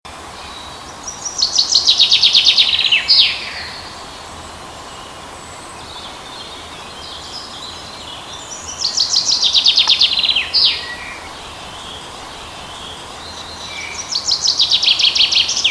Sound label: fringilla coelebs, vogel; hecke; biologie